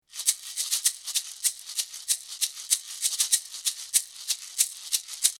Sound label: maracas